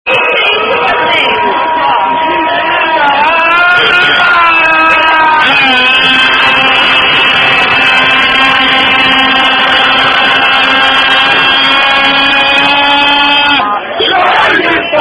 Rugby Song

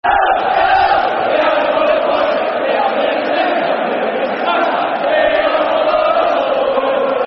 Hello, Hello Football Chants (Soccer Songs); Middlesbrough

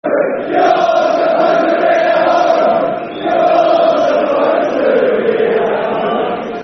You're Supposed To Be At Home, Football Chants; fanchants; Ipswich Town Itfc

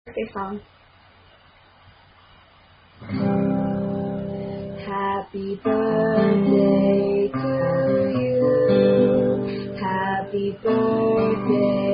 Birthday Song; Sca Birthday Song Not Volga Boatmen

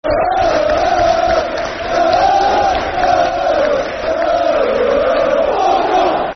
Football Chant; Der, Der Swindon; Swindon Town Stfc

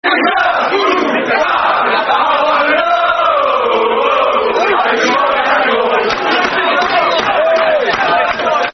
Football Chants (Soccer Songs), Liverpool; John Arne Riise; Liverpool Lfc

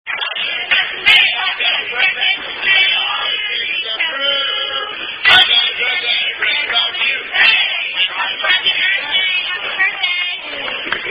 Red Robin Happy Happy Birthday

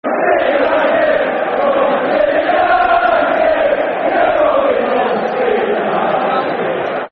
Football Chant (Soccer Song), Man United; You've Only Come To See United

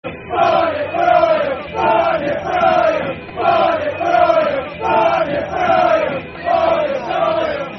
Football Chant, Soccer Song; Barnet; Wycombe Wanderers Wwfc; fanchants

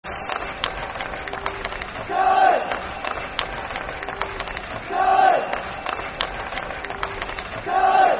(Clap) Football Chant (Soccer Song); Kilmarnock; fanchants; Kilmarnock Kfc

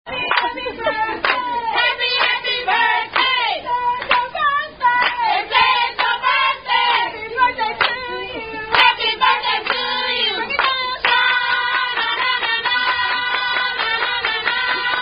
Happy Bday Singers [Happy Birthday (Tune )]; Applebees

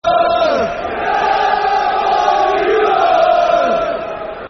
Come On You R's, Football Chants; Queens Park Rangers Qpr

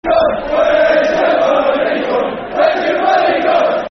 Where's Yer Money Gone, Football Chant; Swindon Town Stfc

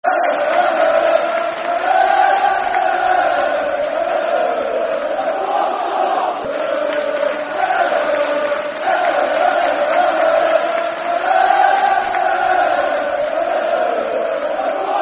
Walsall, Football Chant (Soccer Song); Walsall Wfc; fanchants

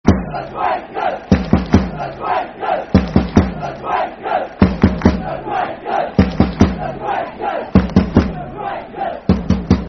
The Quakers, Football Chant (Soccer Songs); Darlington Dfc

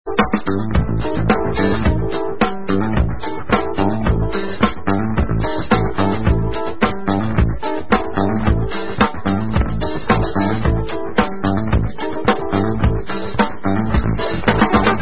Instrumental

